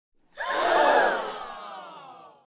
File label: Ooo